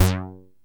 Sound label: TEISCO, BASSES; Masterbits - Vintage Voltage; VV BASSES